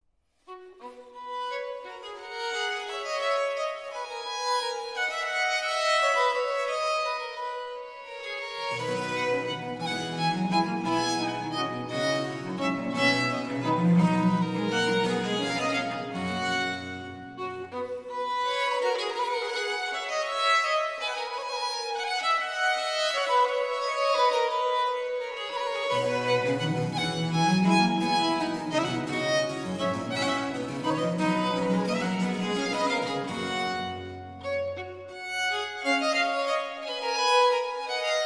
Trio Sonatas, No. 3 in C Major, Sarabanda. Allegro; Vivaldi; L'Estravagante